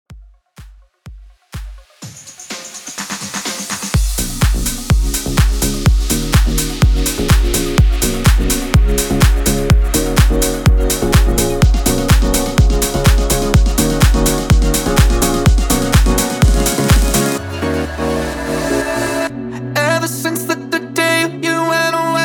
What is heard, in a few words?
FEEL GOOD 125 BPM; MASHUP